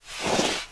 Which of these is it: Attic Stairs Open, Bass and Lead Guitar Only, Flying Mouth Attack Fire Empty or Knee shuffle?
Knee shuffle